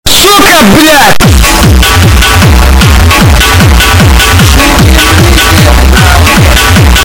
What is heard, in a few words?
cyka blyat hardstyle